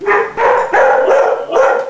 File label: Dog bark, synth